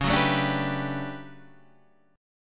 D Major 13th C sharp, chord

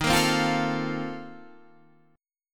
A sharp Major 7th Suspended 2nd Suspended 4th

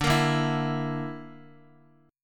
D Suspended 2nd Sharp 5th Guitar; strummed; chordshape

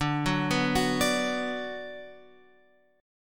D 6th D down Guitar Open F, chord; D6 arpeggiated

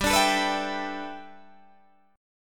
G 6th Add 9th, Guitar, Open D

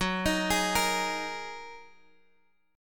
Gb 6th Add 9th Gb down Guitar DADGAD

